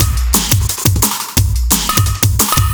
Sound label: Lick Break